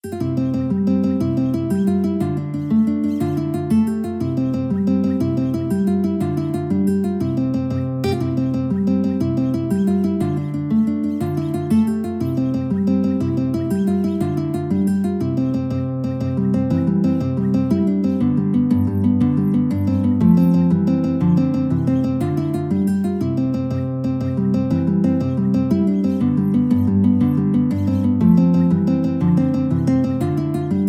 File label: irish jig medley, guitar